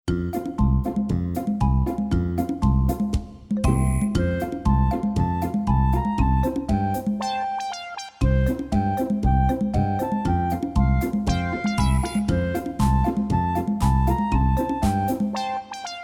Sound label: Melody